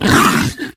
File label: threaten; sven; russian sounds; tushkano